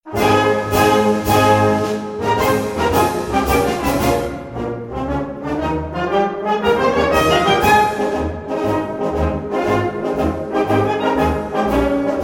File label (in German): Grandioso Marsch